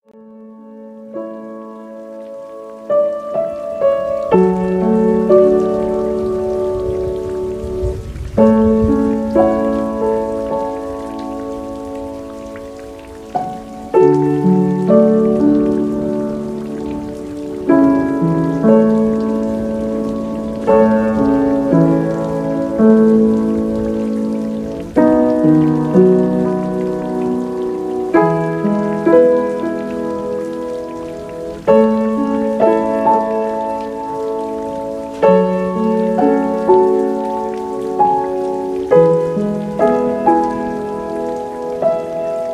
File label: Piano Improvisation